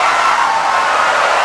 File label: vehicle tire loop; vehicles; Tony Hawk's Project 8; XBOX